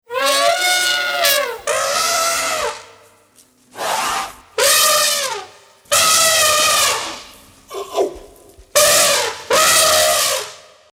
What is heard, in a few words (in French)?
elephant; loto sonore; lotodesanimaux